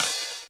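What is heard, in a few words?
open hi-hat; eddyflux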